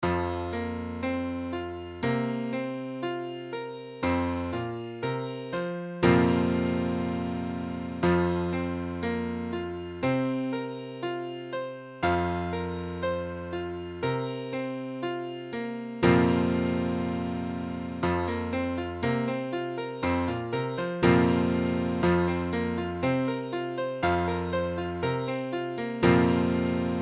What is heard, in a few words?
Fsus4, piano